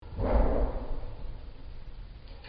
loud distant banging noise

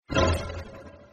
scary noise ahhhh

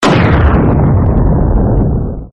laser gun sound

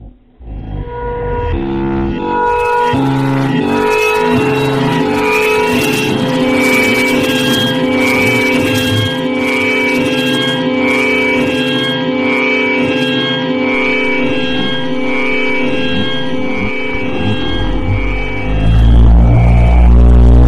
scary sound

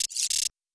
small electric glitch

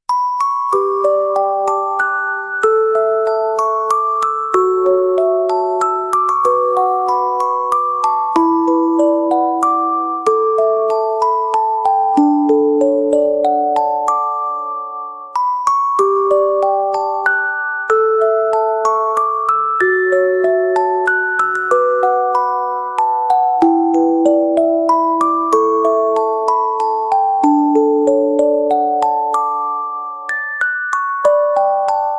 sleep

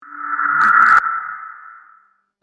spooky

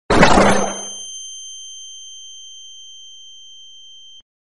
flashbang; Meme Sound

